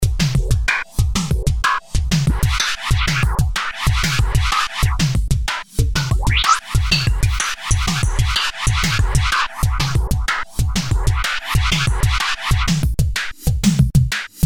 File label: drum; Emu XL1 Xtreme Lead